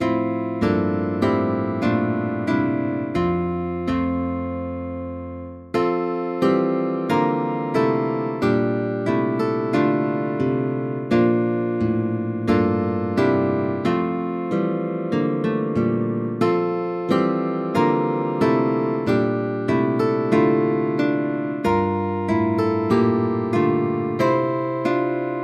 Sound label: guitar faster; Kyrie Gloria Sanctus